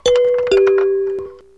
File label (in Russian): sovremennij zvonok